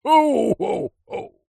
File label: human santa ho ho ho; zapsplat